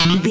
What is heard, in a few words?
cartoon boing retro jump